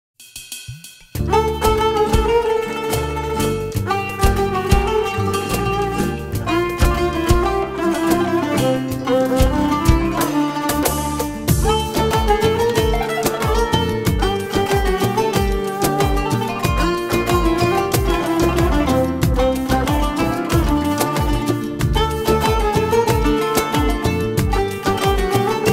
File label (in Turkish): coskulu ve neseli hareketli fon muzigi enstrumantal